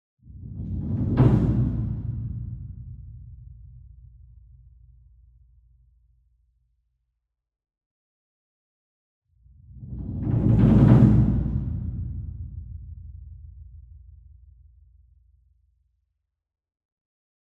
SINGLE DRUM Gong Roll Soft Beater Far, Cinematic Strikes; boom library; Cinematic Strikes Construction Kit